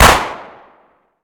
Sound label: ar2 fire; hl2